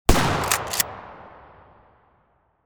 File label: shotgun fire; grubs; weapons